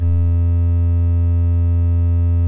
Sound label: Project Firestart, MOS6581R4